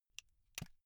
buckle plastic open

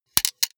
sunglasses metal side piece fold short

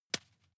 juggle catch soft

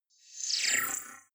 movement creepy robot

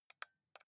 console controller xbox joystick press slow

